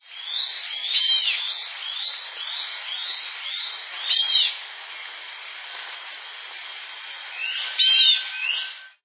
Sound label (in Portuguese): saira de cabeca castanha